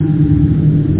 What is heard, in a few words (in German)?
SCHWEB